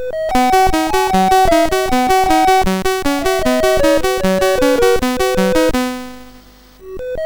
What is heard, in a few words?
BASIC sid MOS6581R2; Baji; hvsc